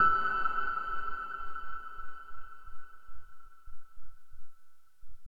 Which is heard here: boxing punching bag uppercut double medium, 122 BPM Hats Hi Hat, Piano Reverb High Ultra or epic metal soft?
Piano Reverb High Ultra